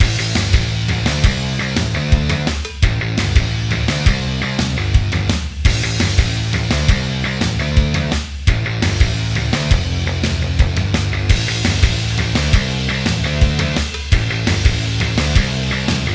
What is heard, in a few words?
3d Music